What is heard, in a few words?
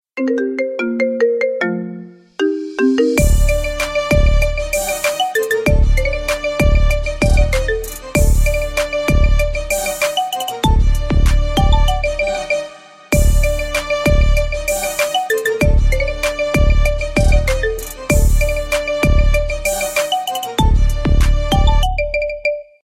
marimba remix